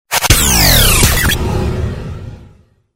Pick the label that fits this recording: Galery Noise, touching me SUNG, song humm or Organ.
Galery Noise